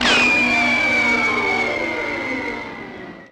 cartoon fall; Greytsounds; CARTOON FX

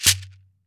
MARACA TONE; AKAI S-Series CD-ROM Sound Library VOL-5; SHAKER.MARAC

